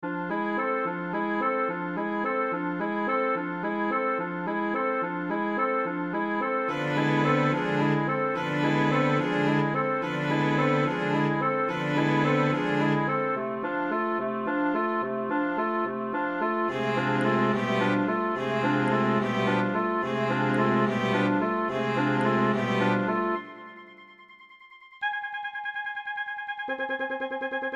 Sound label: fairy music